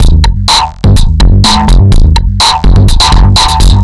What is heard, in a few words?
Drums Strange